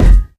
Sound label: physics cube